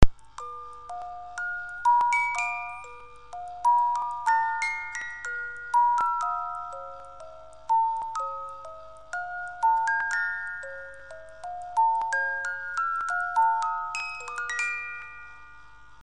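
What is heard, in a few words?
18 lames, Boite à musique suisse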